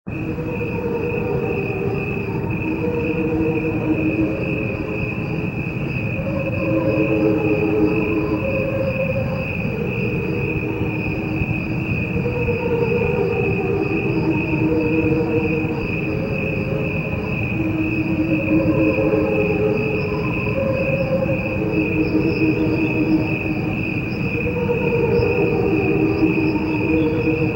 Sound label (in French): choeur crickets